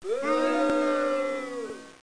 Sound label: BOOING